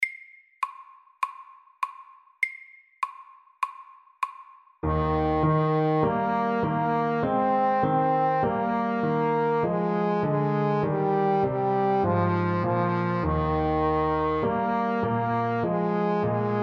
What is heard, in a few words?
Allegretto, 100